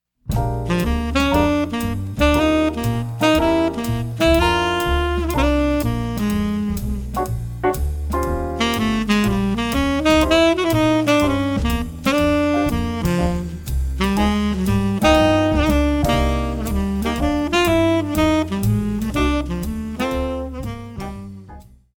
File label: etudes tenor; broadway street